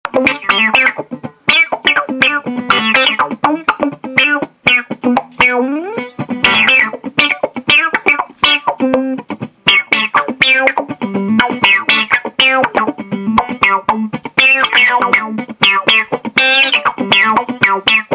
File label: funky q; custom kit